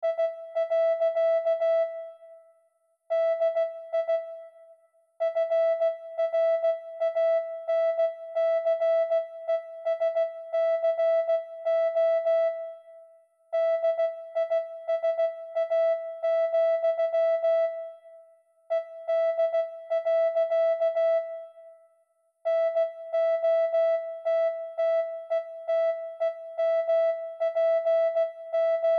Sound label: morse